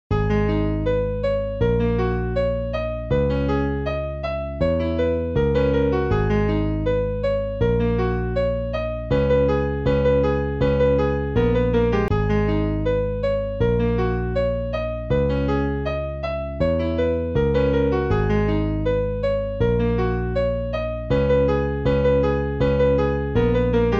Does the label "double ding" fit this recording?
No